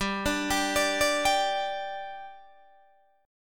G 5th G Guitar DADGAD, chord

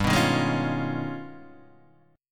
Ab Minor Major 13th

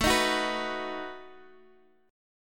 B Major A

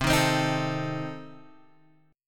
C Minor 6th, C, Guitar, Open G; down; chord shape

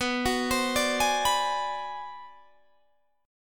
B Minor 6th Flat 9th B

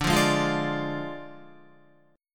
D Diminished D, Guitar; Ddim strummed; 9,8,8,10,8,10

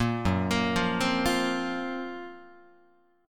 Gb Major Sharp 11th Gb Guitar; arpeggiated; JGuitar

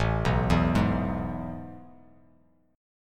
A Major 7th Suspended 2nd, Bass Standard; AM7sus2 arpeggiated; JGuitar

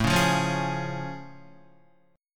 A Augmented Major 7th A Guitar; strummed

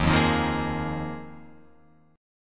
Eb Suspended 2nd Suspended 4th, Guitar, Open F; Ebsus2sus4 strummed; JGuitar

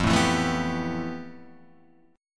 F Major Sharp 11th; strummed; JGuitar

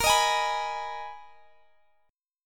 Ab Minor Bb, chord; Abm/Bb; strummed

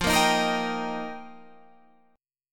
F 7th Suspended 2nd Suspended 4th

